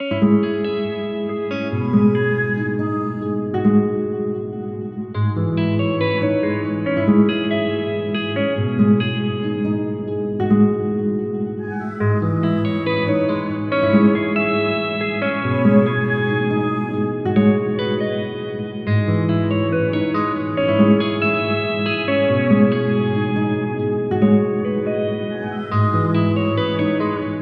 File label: Melody C minor 140BPM